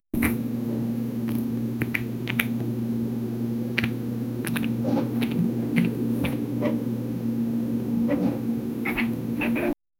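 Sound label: gentle paw taps and scratching, domestic animals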